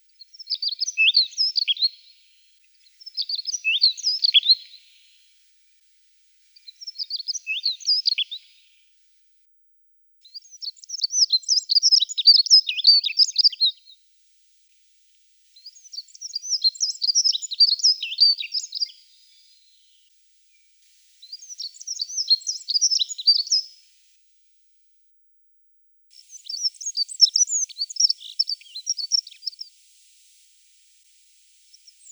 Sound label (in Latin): Prunella modularis